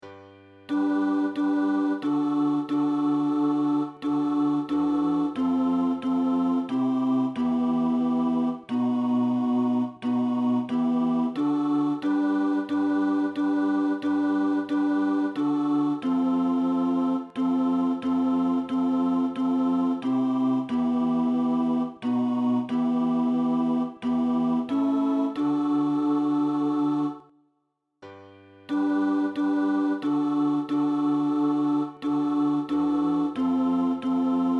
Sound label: tenor